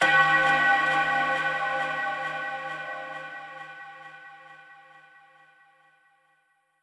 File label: bells